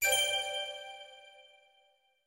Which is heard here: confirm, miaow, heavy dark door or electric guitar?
confirm